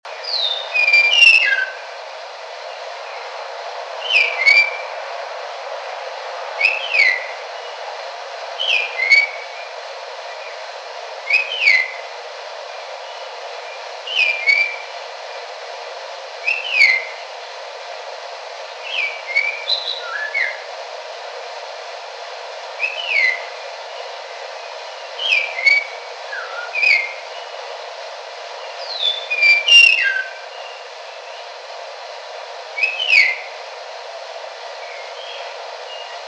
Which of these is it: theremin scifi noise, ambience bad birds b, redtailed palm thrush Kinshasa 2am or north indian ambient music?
redtailed palm thrush Kinshasa 2am